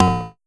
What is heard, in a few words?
Pollard Perc, Syndrum